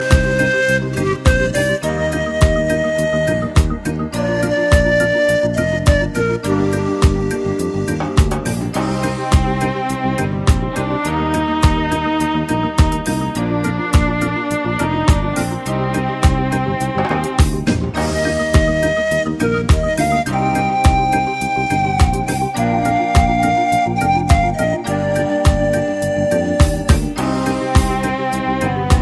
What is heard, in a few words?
magic panflute